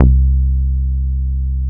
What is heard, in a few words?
DIRECT B. C2, ANA BASS